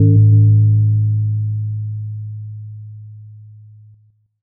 gong

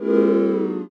cheer short

